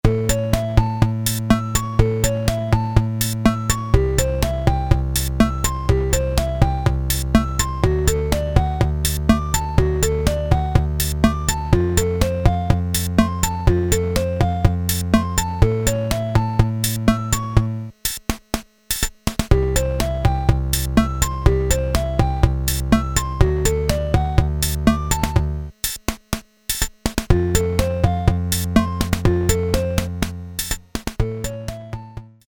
pops, Casio SK-1 rhythm

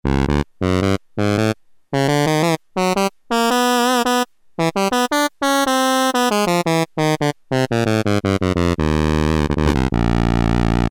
Saxophone, Korg PolySix; Factory Presets Bank A

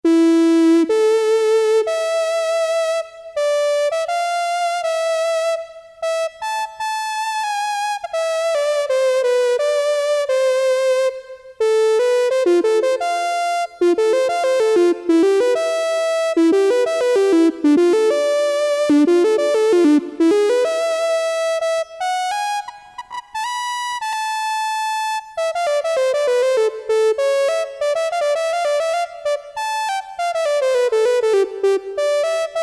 Violin, Farfisa Syntorchestra Mono

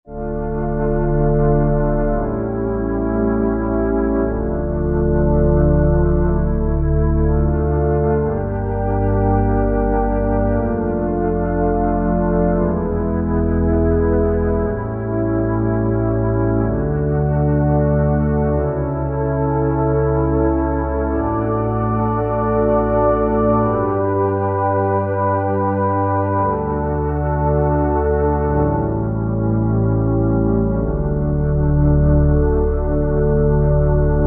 Dark Ensemble, Roland SR-JV80-04 Vintage Synth

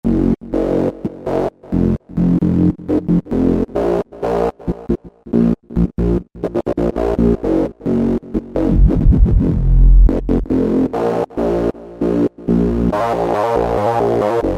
Blade Bass, Korg microKORG; Factory Voices

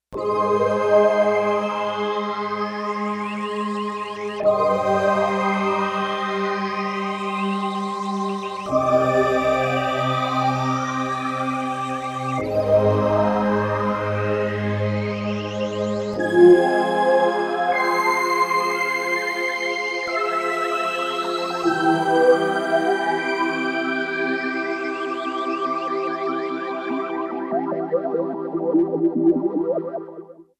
Rainstick Mix, synth

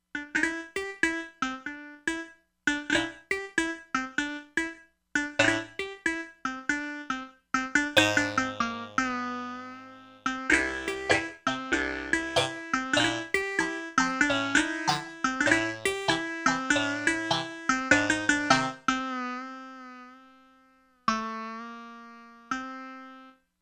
O Woo Harp, Korg MSC-07S Synth

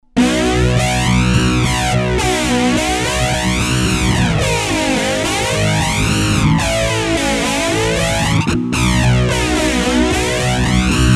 JET DRIVE

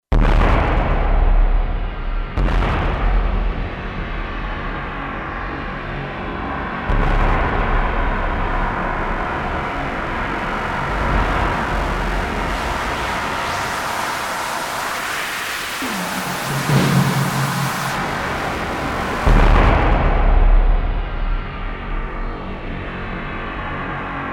Doomsday, Kurzweil K2500X; Factory Programs

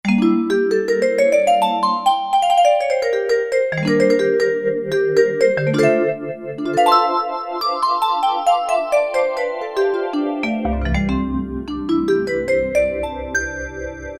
Vibe n Me, E-mu Proteus-1; Presets

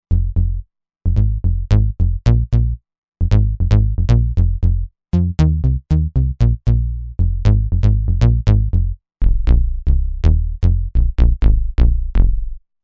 Bass

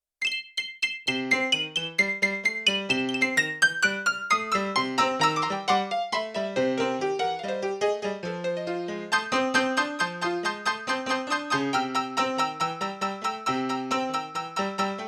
Rock & Roll 61 High, Grand Piano; Yamaha TX16W; Yamaha CFIII Grand Piano

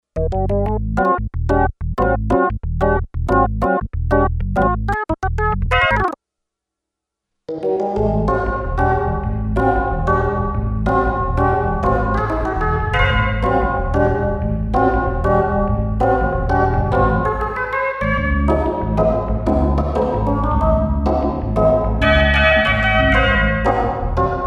Rev Room

